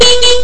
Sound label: minihorn twin